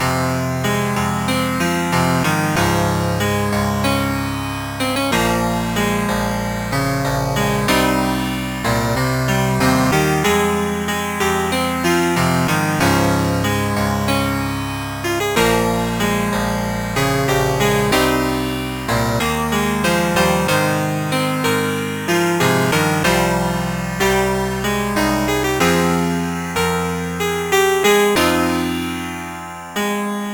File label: piano ballad; Beathoven